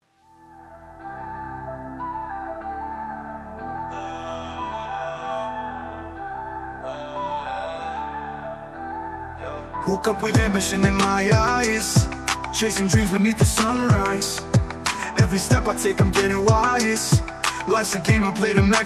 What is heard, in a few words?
hip hop vocals